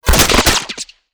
Mgun Skin

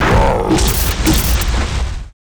attack

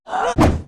Spell AttACK

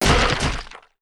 STINKBUG WAR DIE

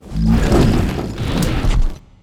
Peace idle; Eldcrochi; Mob

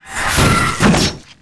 war attack

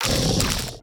VOLCANIC GRUMBLE WAR WALK

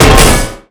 DBgun Metal, Damage

